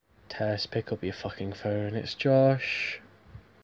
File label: Is Calling Jazz